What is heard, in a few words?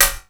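snare drum, drum & bass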